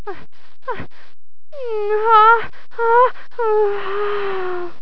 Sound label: orgasm